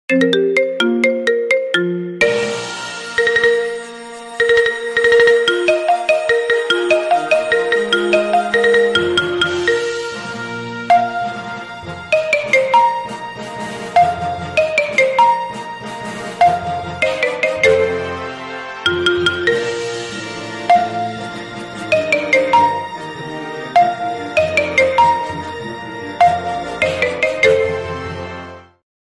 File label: Remix Ringtone Marimba Remix; Star Wars